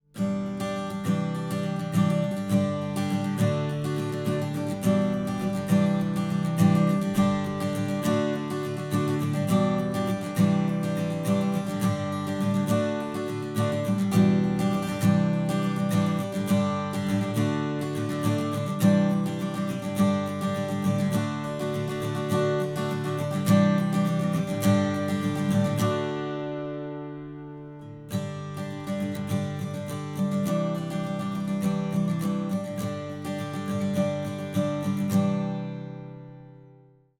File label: OM strum